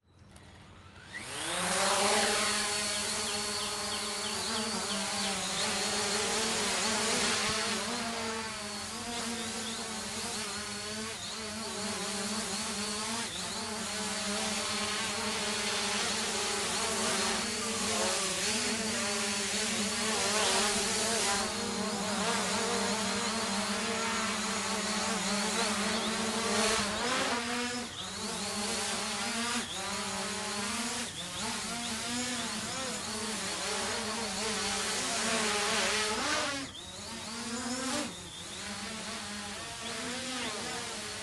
quadcopter drone flight binaural mic drone on hover maneuver hover land; Sonniss GDC